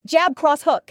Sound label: Jab Cross Hook